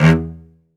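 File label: SOLO cello, Strings; ILIO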